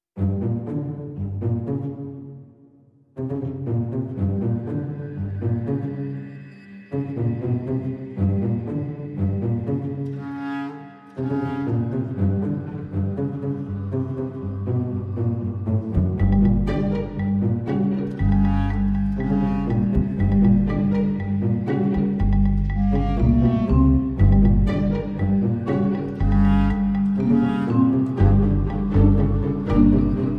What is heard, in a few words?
No Perc